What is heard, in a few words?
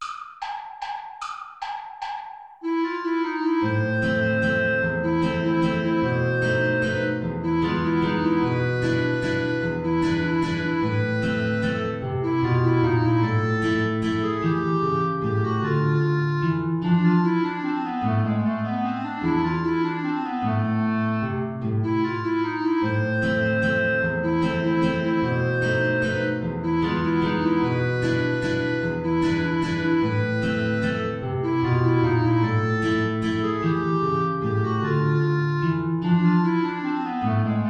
play along without flute; traditional